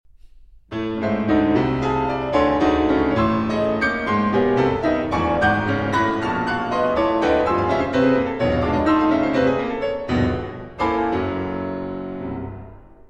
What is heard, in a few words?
Piano Pieces Bewegte Achtel; 3 Piano Pieces, Op. 11; Schoenberg